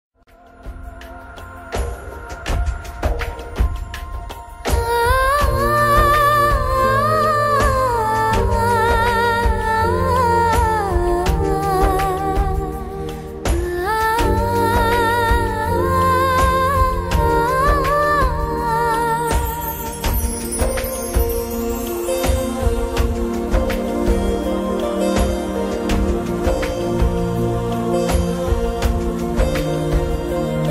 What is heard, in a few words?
hindi new ringtone sad song